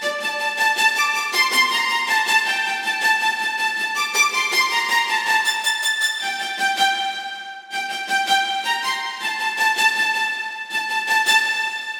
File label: Violas Stacatto